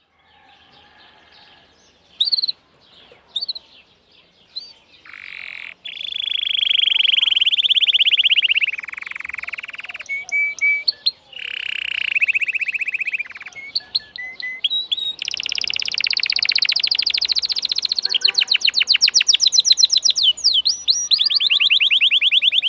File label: Canary Singing